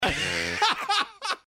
Phlegm Laugh; Upper Decker

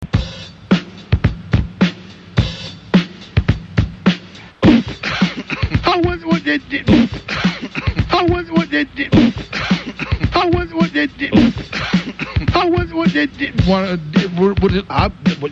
hardline noises to

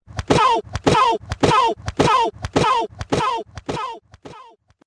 hit fast

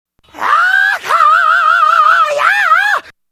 yell

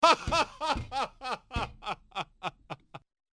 laugh slam table

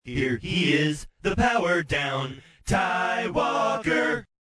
power down

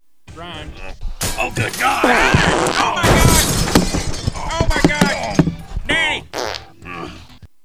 fart n fall

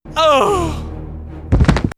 generic guy fall thud

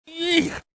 grunt

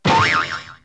boing

